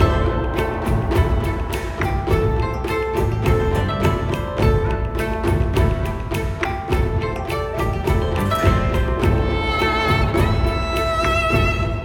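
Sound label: background music preparation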